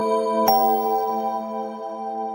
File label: Cute Blink